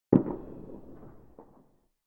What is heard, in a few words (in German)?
entfernte detonation; geraeusche digital